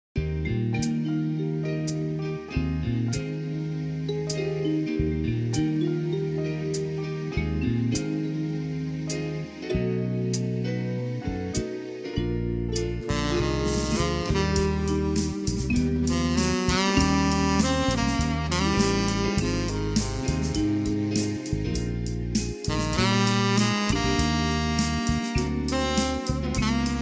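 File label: TENOR, sax; PERFORMANCE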